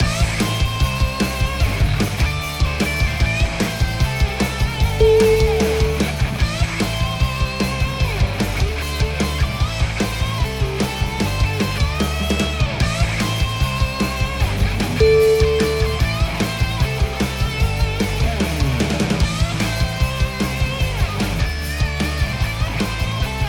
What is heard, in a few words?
sports power rock